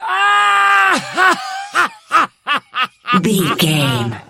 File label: voice male laugh intense